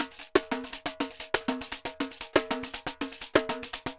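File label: pandeiro, DRUMS SAMBA; Best Service ProSamples vol.14; AKAI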